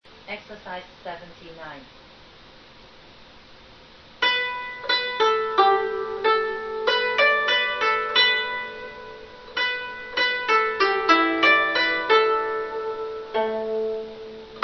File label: zheng